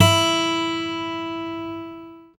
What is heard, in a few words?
GUI AC.; Sound & Vision; Gigapack I CD 2; GUI ACOUSTIC 1